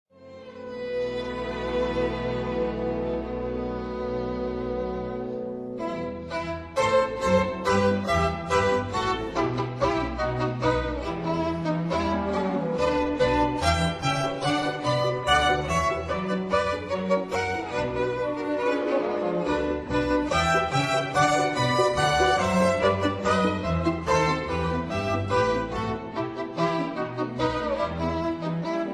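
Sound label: Scène de la Csárda for violin & piano; Hejre Kati; Op. 32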